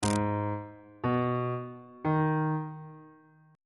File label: c sharp minor chord 5 is g sharp minor on bass clef